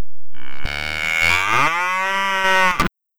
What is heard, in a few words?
cow